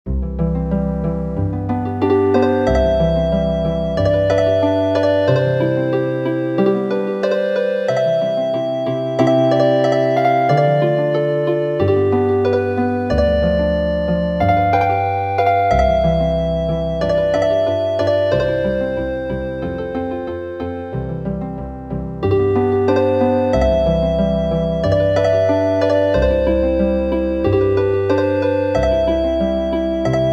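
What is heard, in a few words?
Healing Harp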